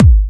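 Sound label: BD Trancy, bass drum; Club; VEC1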